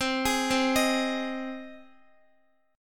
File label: C Minor Sharp 5th C down Guitar; Cm#5 arpeggiated